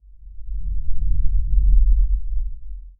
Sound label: a short awe filled cosmic; universe